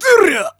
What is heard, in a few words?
voice attack